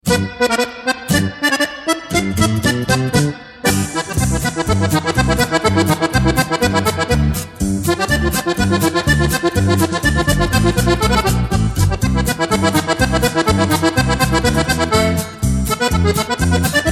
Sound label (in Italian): polca